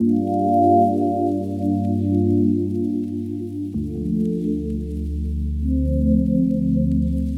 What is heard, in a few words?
Cm7 bluesleep, Music Loops; Touch Loops; BlissedOutBeats